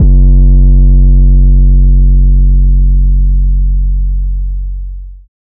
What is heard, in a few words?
808 - F#; Cymatics